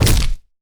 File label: fall pain, player; gesource